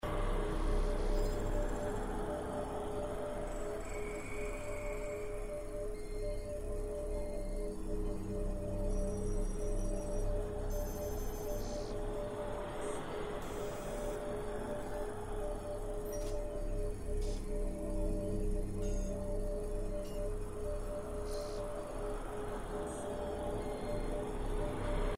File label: Background; Project Blackstone